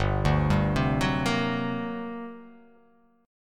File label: G Minor Major 11th, G; GmM11 arpeggiated; 3,5,5,5,6,5,x,x; JGuitar